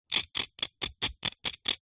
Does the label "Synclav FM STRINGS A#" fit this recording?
No